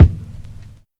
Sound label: long room reverb kick drum sound e key